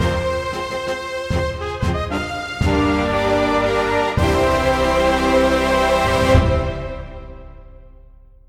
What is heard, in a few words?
Fanfare; Elin